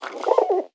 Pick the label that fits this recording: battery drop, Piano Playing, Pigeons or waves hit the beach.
Pigeons